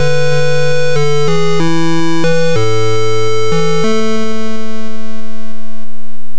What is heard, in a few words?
Game Over; Rick Dangerous. Game Over; CNGSoft